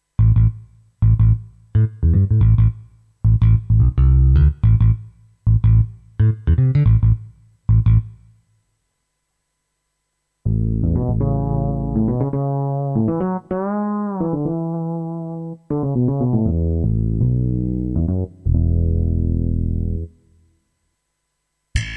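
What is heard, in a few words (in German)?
solo bass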